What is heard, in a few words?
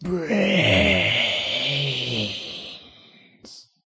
Beach Groan